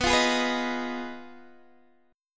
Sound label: B 6th Flat 5th B down Guitar DADGAD